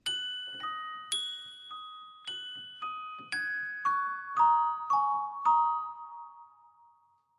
Clavichord Chinese M; keyboards

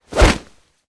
Heavy Cloth Snaps

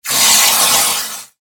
Hard Water Spray, hose

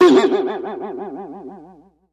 Boing Box Vibrato

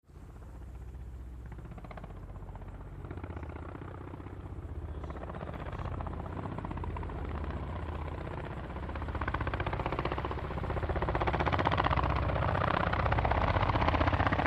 AH1 Apprch By Awy Reve, ah-1-cobra

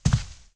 FOOTSTEP, singles

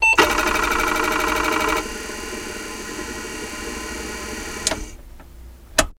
Apple II Disc Drive, computers

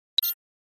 Flash Beep HF Elect Pu, flash beep

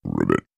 Frog Ribbit Deep Fr; animals wild frogs

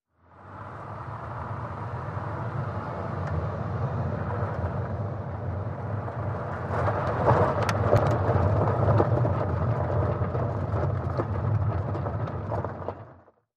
SUV Trk Pav To Gravel

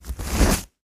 facial tissue pull

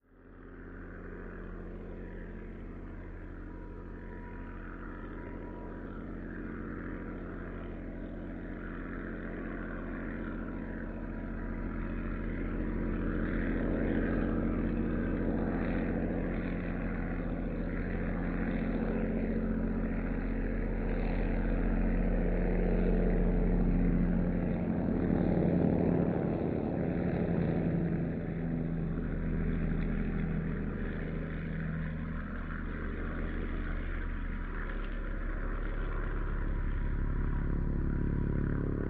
Distant Propeller Pl

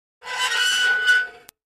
Metal Scrape On Metal

